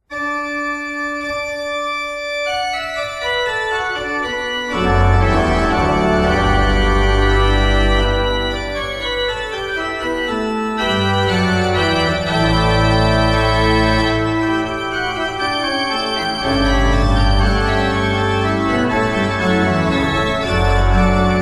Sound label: Allegro maestoso; Mendelssohn